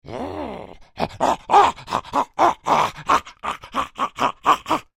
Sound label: Creature Troll Excited Panting; Monsters; Horror